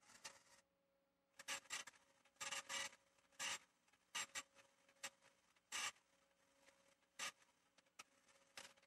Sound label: atm deposit